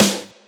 Snares; Vengeance - Essential Clubsounds Vol.4

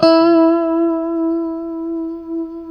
E JC120; Spectrasonics; Hans Zimmer Guitars VOL-1; JC-STRAT MF

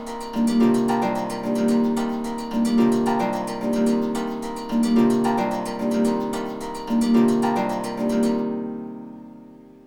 Trance SYNTH SEQ; BOSA; AMG; Global Trance Mission VOL-2

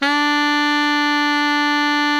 baritone FF D 3, Sax; Giga Samples Collection

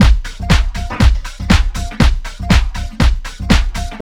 house loop

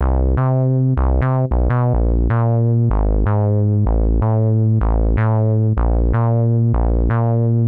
125 Res Synth Bass C; PowerFX - Nu Jazz House

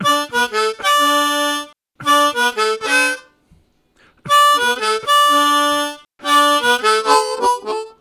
Octave Walk, Harmonica; Zero G

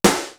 slap snare; East West; Global Sounds

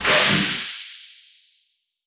sfx sci fi; Masterbits - Switch FX

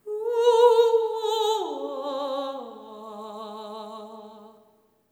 LAMENT; Spectrasonics; Symphony of Voices; LAMENTING

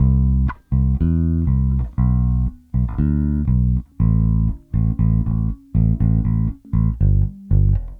Bass, 70s Analog Funk; Sonic Foundry (Sony Creative Software); What it Is!; Classic Caddy

